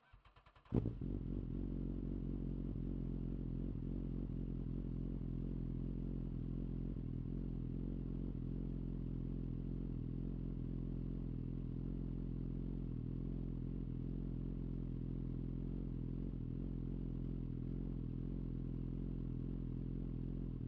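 BMW S1000RR HP4 onboard startup idle blips constant rpms in neutral shutdown exhaust; BMW S1000RR HP4 2012; Pole Position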